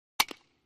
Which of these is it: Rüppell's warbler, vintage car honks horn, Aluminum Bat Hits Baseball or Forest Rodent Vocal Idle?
Aluminum Bat Hits Baseball